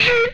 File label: Sci Fi Gun, Blast; Space Blasters2D